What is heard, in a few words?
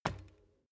bicycle street foot pedal